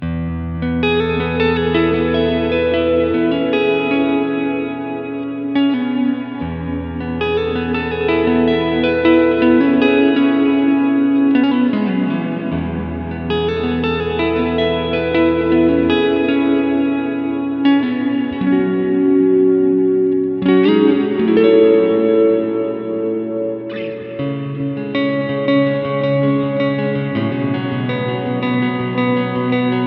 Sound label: Ambient, pickups